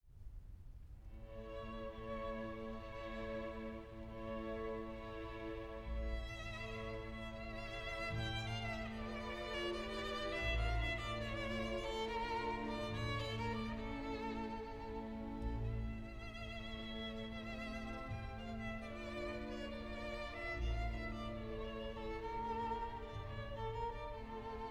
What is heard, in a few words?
Roumanian Folk Dances for Orchestra, Horn Dance; BB 76 (Sz. 68); from Bisztra; Chicago Symphony Orchestra; Bartók